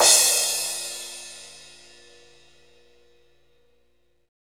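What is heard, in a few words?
CYM cymbal, Hip-Hop Rap Kit; Northstar; Drumscapes Roland; Rap Kit 1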